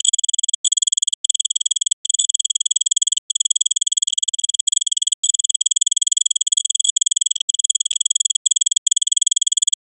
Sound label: super loud alarm, obnoxious